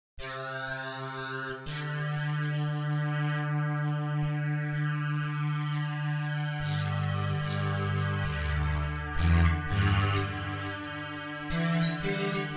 String; String Studio

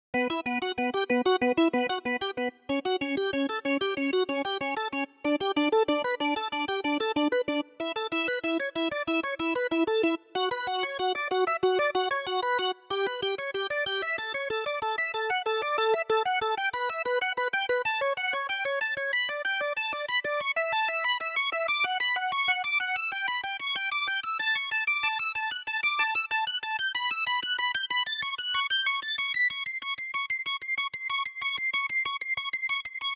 Synth Master

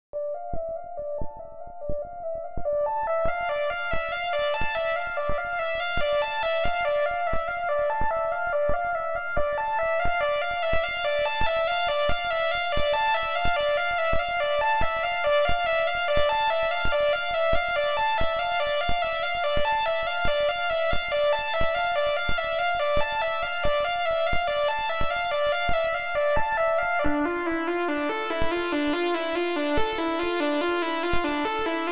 ARP 2600 V

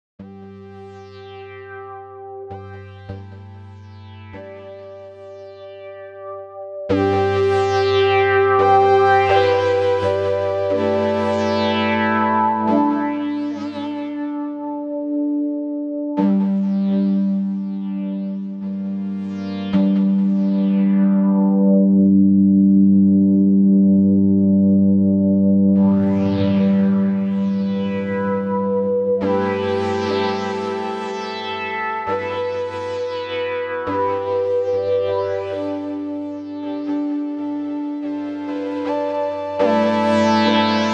Objeq Delay

